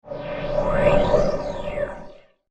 Laser Gate Time Warp, science fiction sound effect; star wars / trek style games; Andrew Fleming